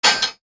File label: clang; SimplyGladiators